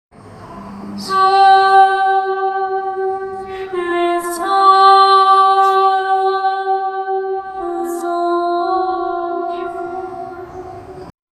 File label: angelic church noir, choral